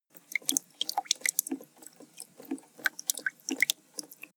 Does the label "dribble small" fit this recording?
Yes